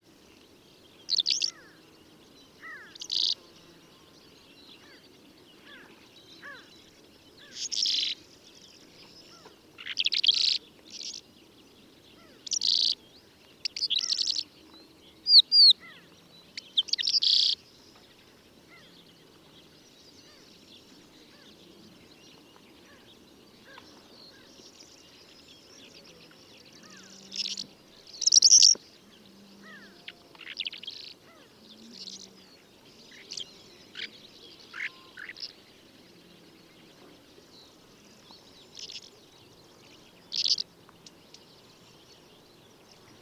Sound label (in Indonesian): suara call calandra lark